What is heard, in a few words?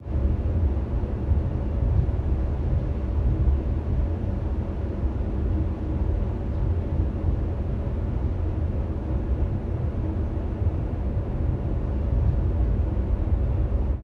submarines engines; World Of Warships